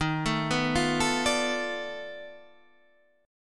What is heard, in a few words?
B 7th Sharp 9th Flat 5th, guitar